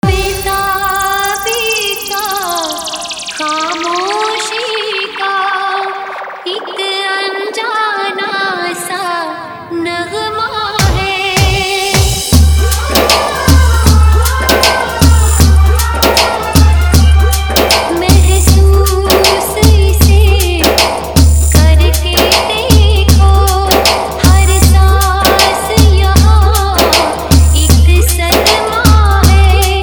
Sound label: 90s Trending Hindi Dj Remix Song Hard Faadu Electro Bass; Prayagraj